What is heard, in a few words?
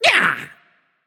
grunt attack, voicepack; smite; jorm